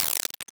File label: Title Transition Chopper; Production Element Title Transition